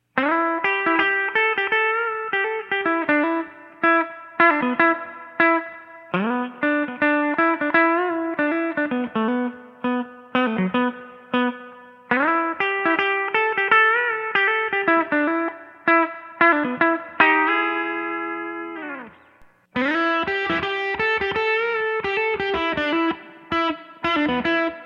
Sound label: Tele Hot Vintage Pups